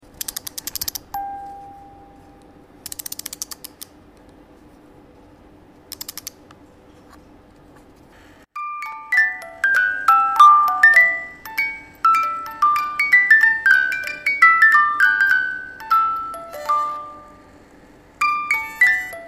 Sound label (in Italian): carillon; JOY TO THE WORLD